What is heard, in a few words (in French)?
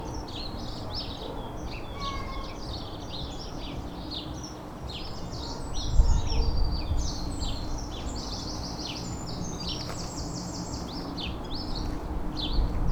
oiseaux